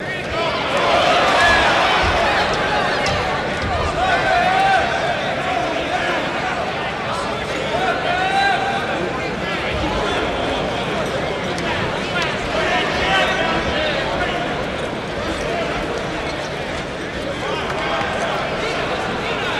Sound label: Crowd Walla Med Light, boxing